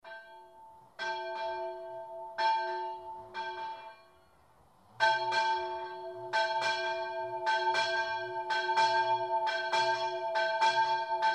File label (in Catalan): campana